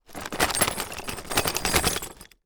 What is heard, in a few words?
Foley Wood Chips Metal Clamps Plastic Tub Rattle